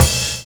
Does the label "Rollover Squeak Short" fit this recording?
No